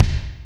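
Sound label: kick Ballad, Alesis D4; 300 Drum Machines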